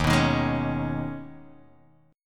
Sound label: D sharp 7th Suspended 4th Sharp 5th